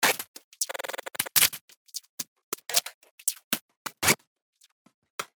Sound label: 90 Glitch Top